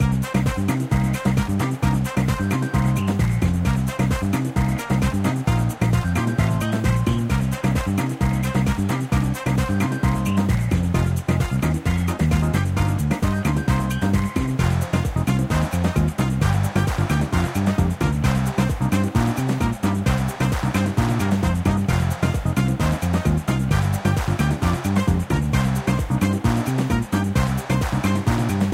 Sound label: ingame muzak; footbrawl